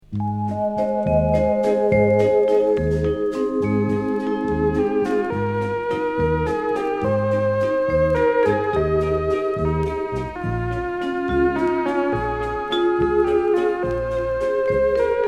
orchestra

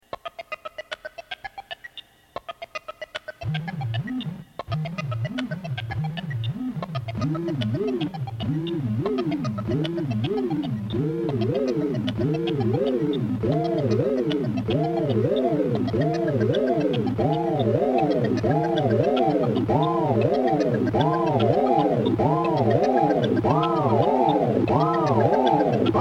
exp instruments

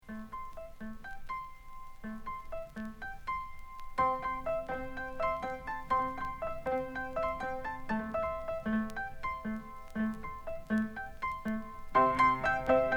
monofonic orchestra

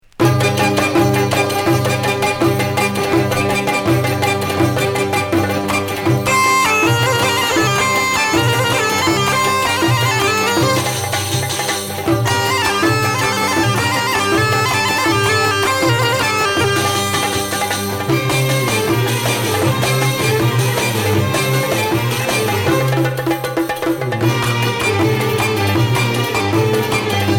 lebanese dabke orchestra

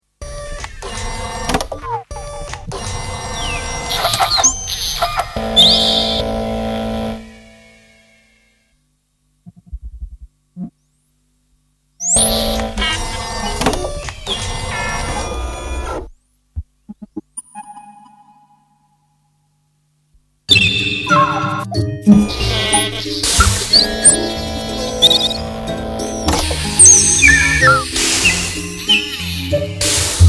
machine messages

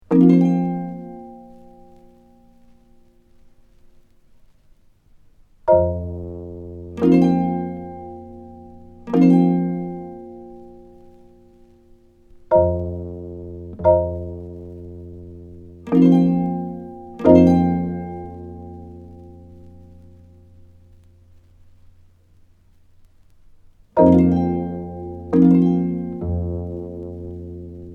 studio percussion

